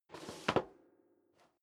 step sneaker metal rattle scuff; schritte

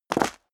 step high heels concrete grit jump; schritte; Footsteps

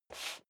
step leather shoes metal dry slide short; schritte

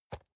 storage grab table soft, box; objekte

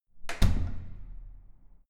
security door close

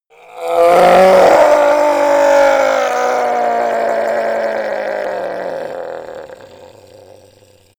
goblin groan painful weak; schreie

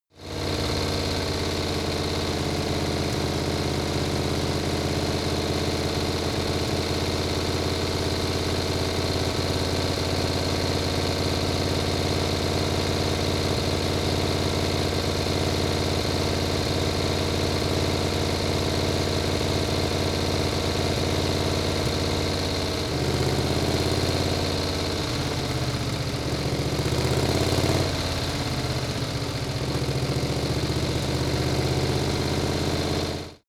motorcycle harley drive medium speed sequence

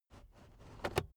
car headrest full adjustment unsteady rattle

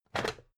pan take slide short; objekte; topf

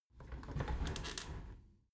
window handle squeak turn close halfway soft; objekte; fenster

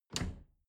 dishwasher door snap close

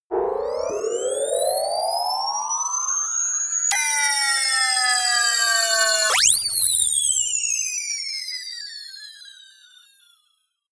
rise fall, sweeps swells; comedy-cartoon; soundpool

